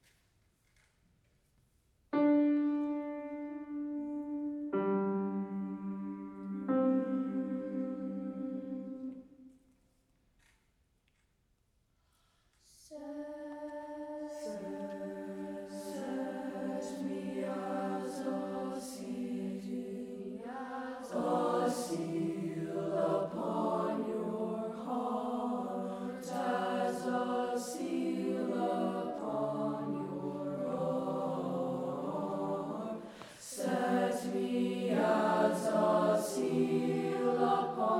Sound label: tenor bass